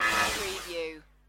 Space Cut

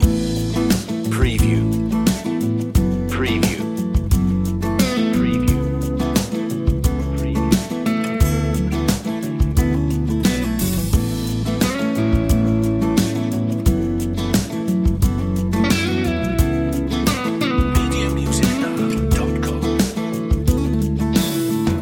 good harmonies loop

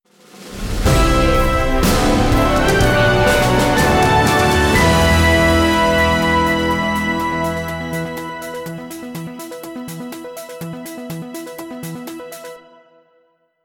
news flash

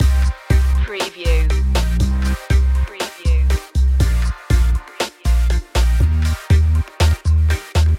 bittersweet electro hip hop loop

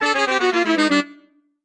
Music Saxophone Descend

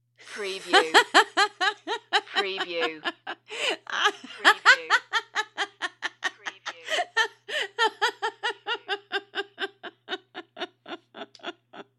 Lady Laugh Long

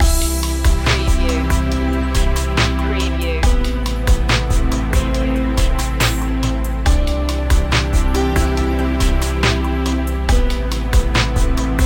new life loop

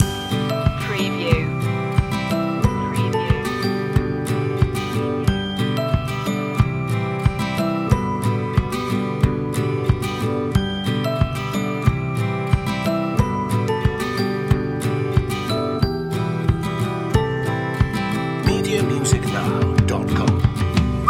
whistle along loop

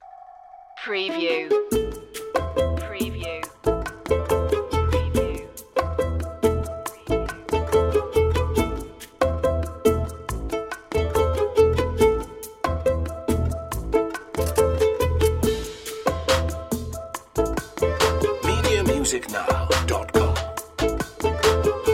tango

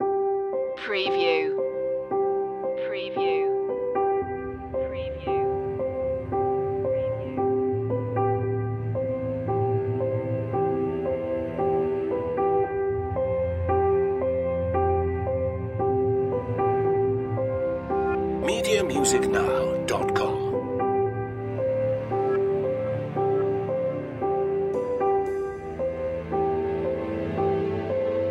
no melody

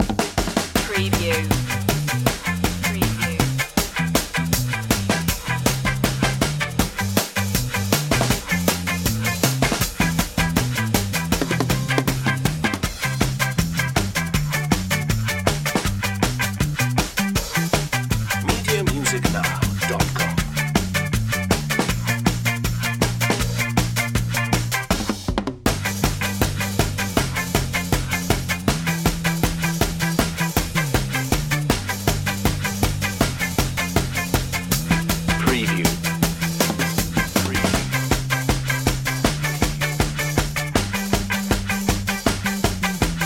happy ska town guitar bass and drums

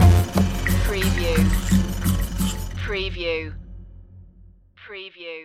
retro video game kazoo world stinger

